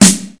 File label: airy sizzle steel snare drum sample f sharp key